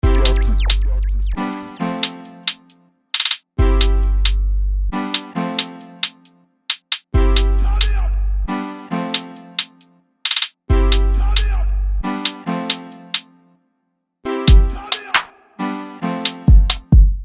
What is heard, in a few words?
Instrumental